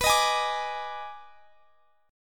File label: D sharp Major 7th Suspended 4th Sharp 5th B